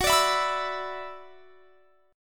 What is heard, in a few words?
Gb 7th Suspended 4th Sharp 5th Gb; Gb7sus4#5 strummed